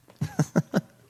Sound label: cute laugh